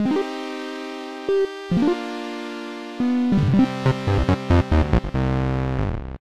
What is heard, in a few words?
game over; sigurdrshavoc